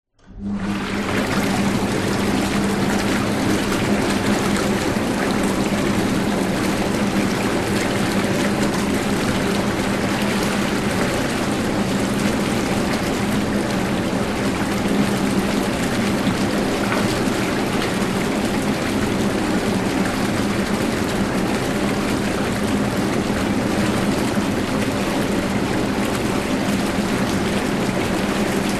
household bath spa on run off short bubbles motor whirr room ambience; zapsplat